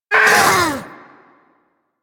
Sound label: Vocal Pain F; Warframe; Hunting Critters; Vulpaphyla